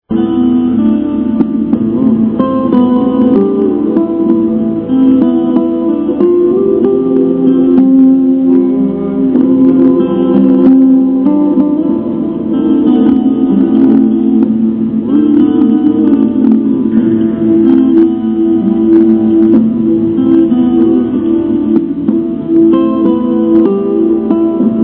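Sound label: cry for rain, native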